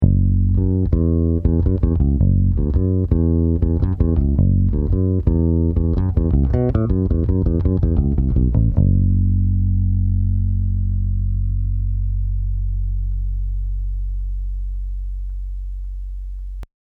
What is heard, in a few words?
Bass 10 Treble Flat